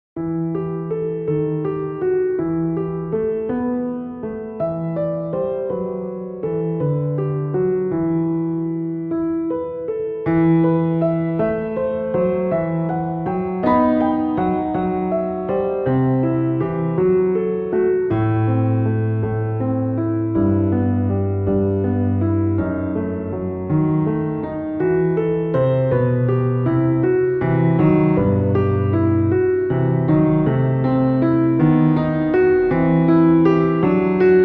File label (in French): etude pour piano; etude pour piano no1